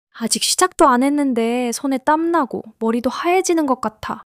struggle long struggle

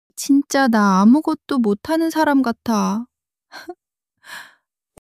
crying; monologue

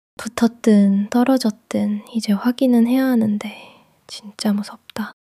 struggle long struggle

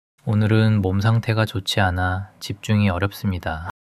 struggle long struggle

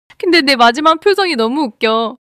laughter; monologue

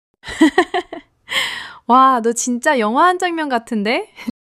laughter

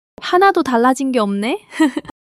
laughter

